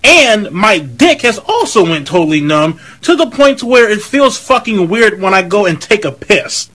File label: beat